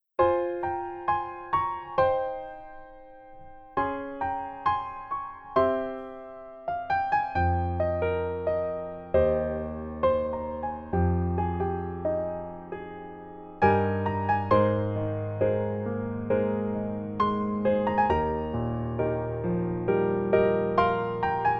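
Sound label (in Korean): Piano version 멜로디MR -1키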